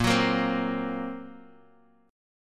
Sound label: Bb Suspended 2nd Sharp 5th Bb Guitar Open F; strummed; chord shape; x,5,6,5,3,x; JGuitar